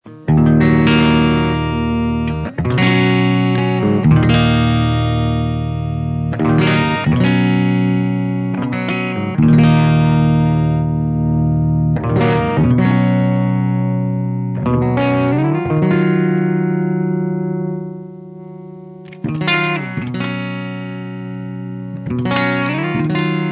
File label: sedative pling